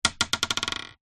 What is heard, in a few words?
industrial metal ball bearing down on wood